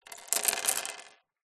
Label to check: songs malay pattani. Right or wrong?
Wrong